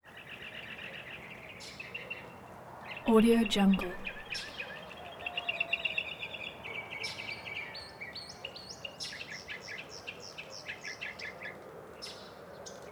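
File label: rural daytime exterior birds tweeting chirping; prosoundfx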